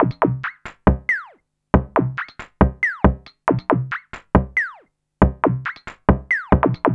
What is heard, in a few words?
Electronic Percussion